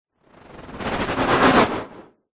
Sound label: sound design whoosh white noise stuttering fast